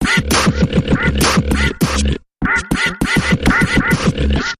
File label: Mechanical Percussion Variation Short Version